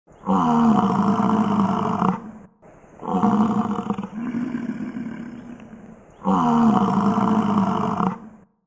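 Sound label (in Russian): rev zubra, roar of European bison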